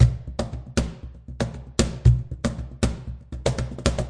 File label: 117 cajon roller